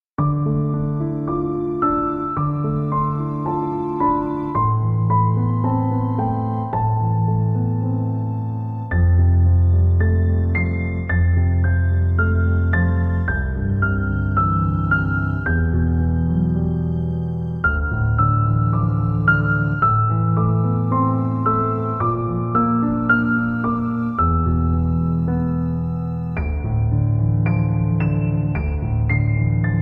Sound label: piano yoga